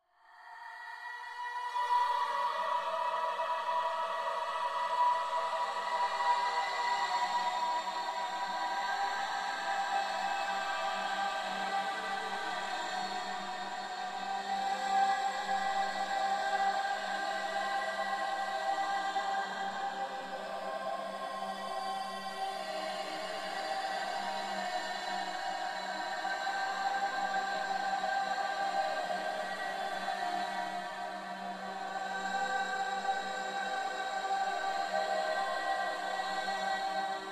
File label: rope spectral blurring; Women's Song with Spectral Blurring; Michael Norris